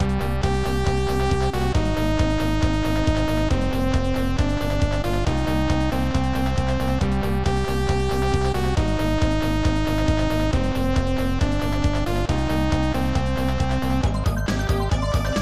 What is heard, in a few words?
Rock Paper Scissors Battle; Youchien Senki Madara Saga; SNES